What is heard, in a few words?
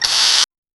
camera flash powder and s